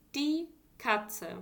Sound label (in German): katze; die tiere